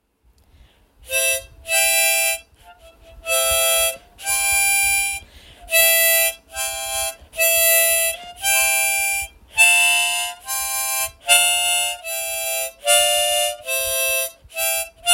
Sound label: Harmonica